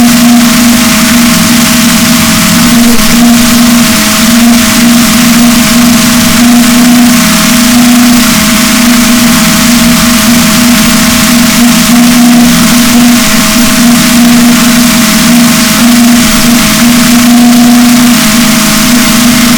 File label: rorquals negatif, boat; Chile